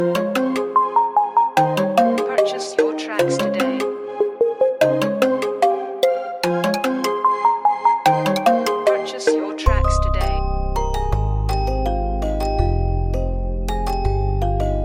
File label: Loop Kit; WavSupply; Rio Leyva; Colossus Loop Kit